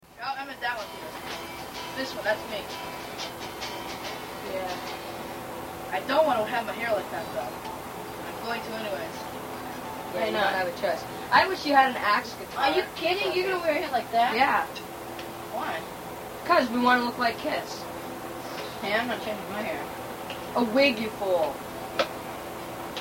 Guitar

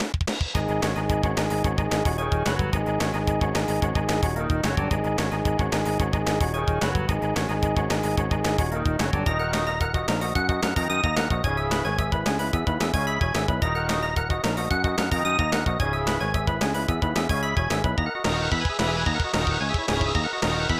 Bike Chase; Chrono Trigger

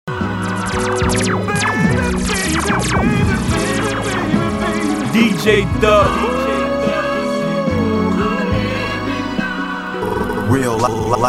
Beat

